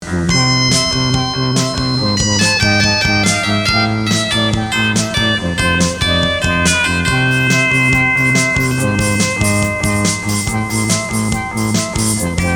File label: Cell Phone Lead Synth